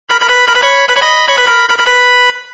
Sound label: flint, Ringer; V710 Ringer